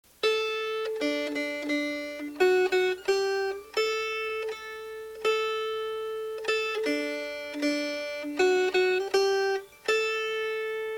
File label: Melody